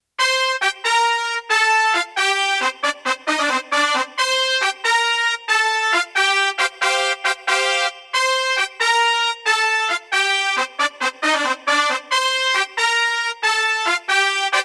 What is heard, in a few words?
stack tp sect